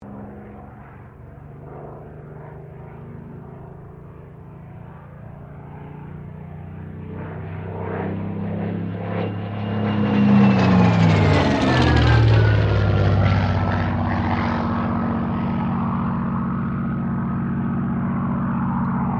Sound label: AIRCRAFT SUPERMARINE SPITFIRE MK-XVI PASS